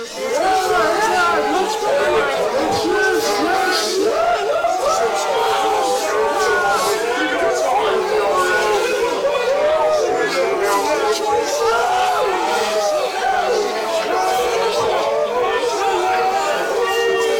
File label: crowd shouting